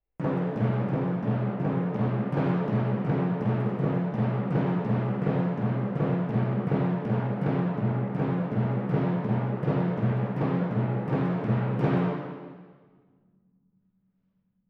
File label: Timp